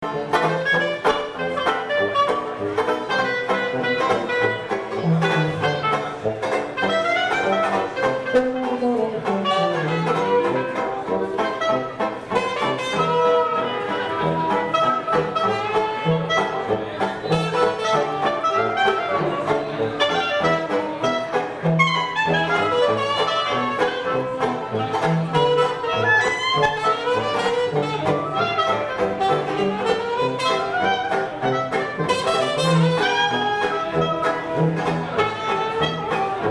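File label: strolling dixie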